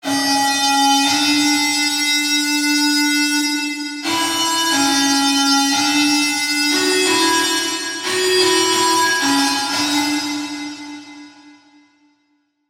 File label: Lead; Routed Sound; Spire Expansion - Flame Summoner Vol.1